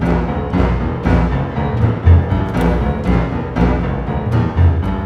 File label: GRO, Orchestral Loops; 128 COAST ROAD